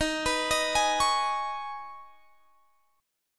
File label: Eb 7th Suspended 4th Sharp 5th, Guitar, Standard; Eb7sus4#5; arpeggiated; x,18,21,20,21,21; JGuitar